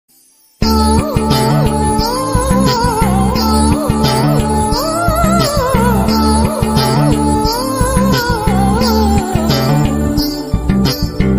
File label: love bgm tamil ringtone; majunu